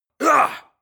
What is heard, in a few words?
male fight